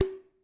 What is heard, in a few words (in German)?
Weissbier